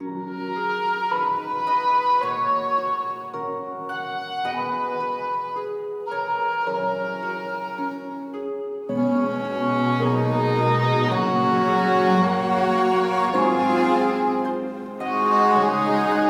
Submerged Trumpet; Genshin Impact Cantus Aeternus 2024

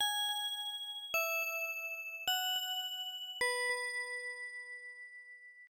School Chime; pc-9801 opna; Only You Seikimatsu no Juliette-tachi

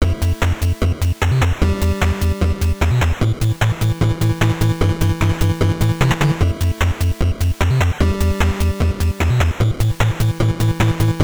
Underwater; Toxic Crusaders; NES

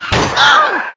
Side bump

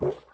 Collision small

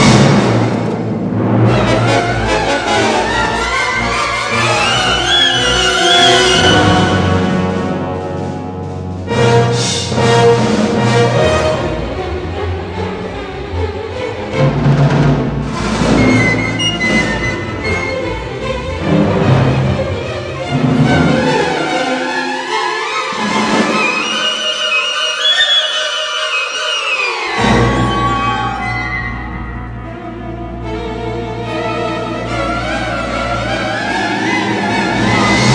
Music Fight; nosferatu the wrath of malachi

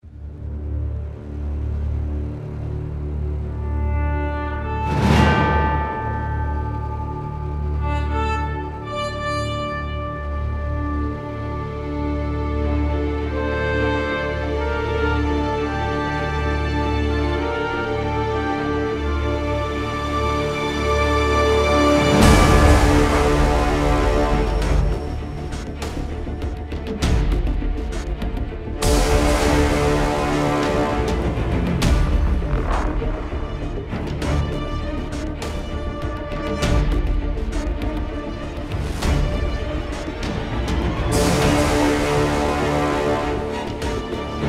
Menu music

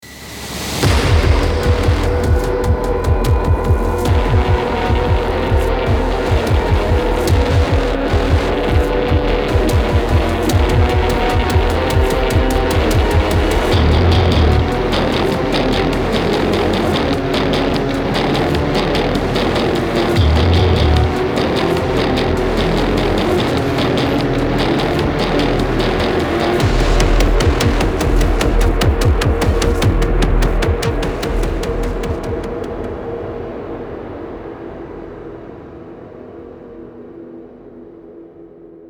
Start

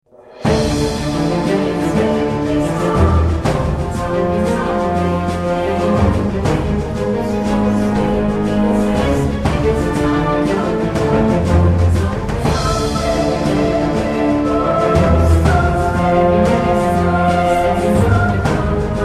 BGM Title; Seed 4; Android game music

